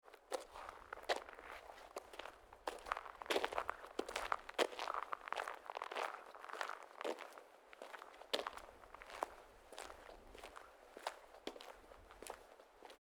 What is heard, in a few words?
footsteps on frozen sidewalk; foley